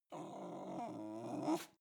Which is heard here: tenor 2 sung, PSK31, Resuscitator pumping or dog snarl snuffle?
dog snarl snuffle